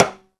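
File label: closed rim, Timbale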